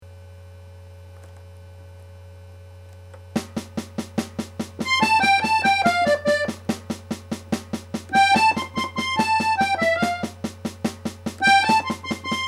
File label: clarinet only